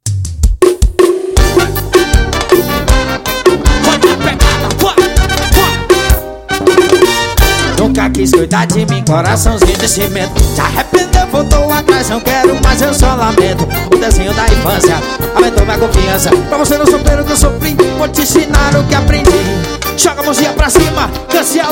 forró promocional

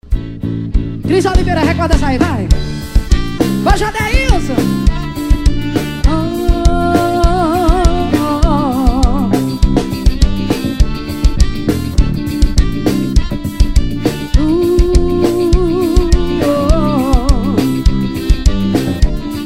forró

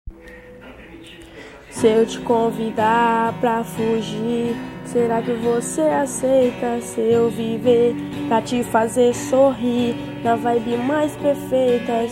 violão

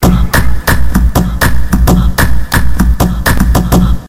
beat palma

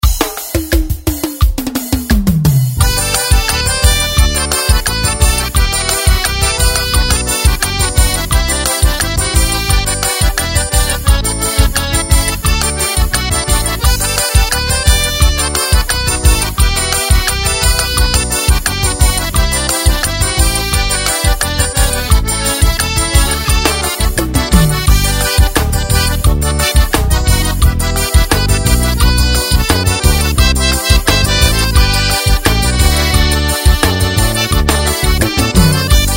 xote

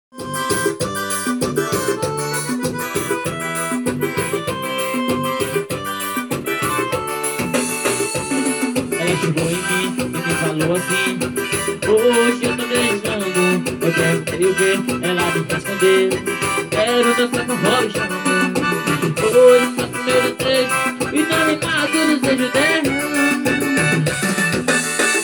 teclados forró

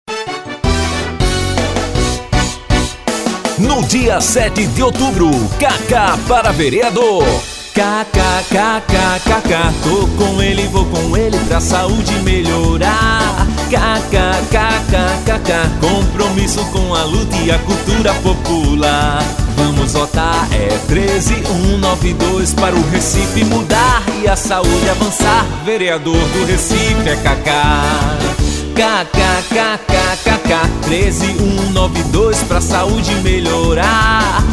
jingle vox

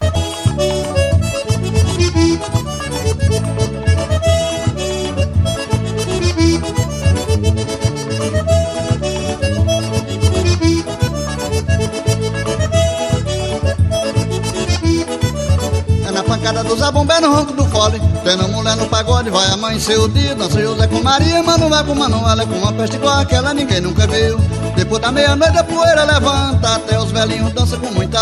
na pancada da zabumba

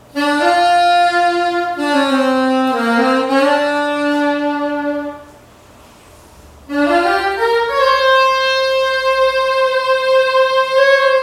improviso

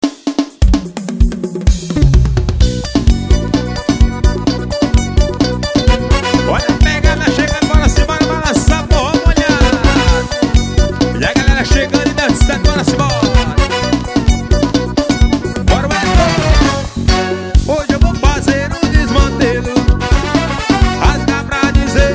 forró

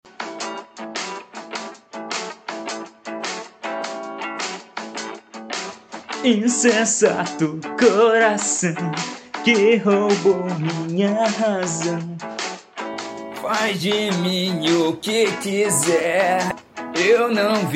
saxofonista

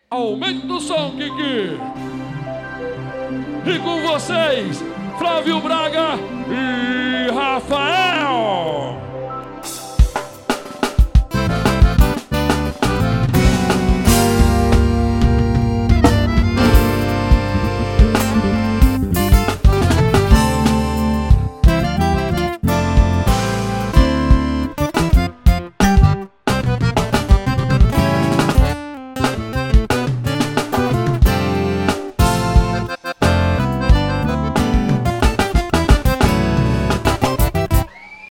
abertura

